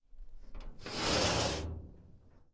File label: Sliding Dumpster Door